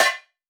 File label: Metal One Shot - C